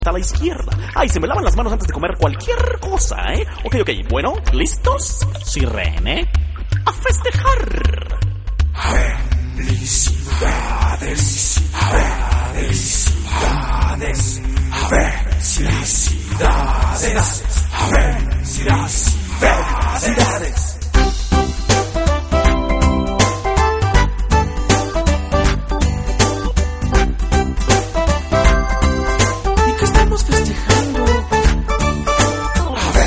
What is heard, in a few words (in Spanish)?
sirene